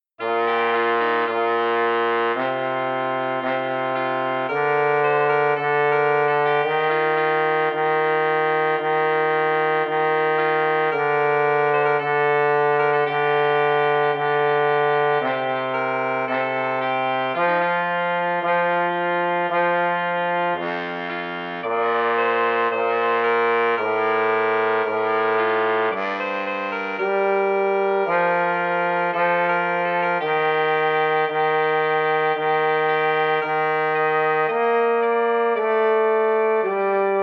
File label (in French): basse; Chants